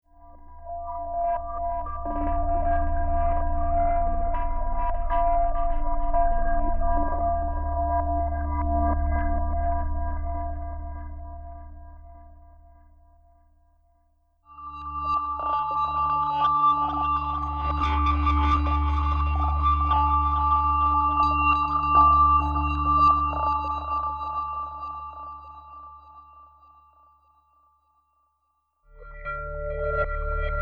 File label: synth